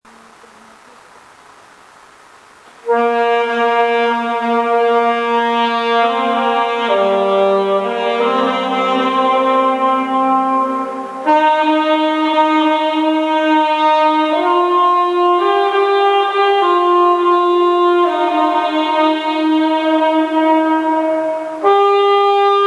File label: acacia rain, SAX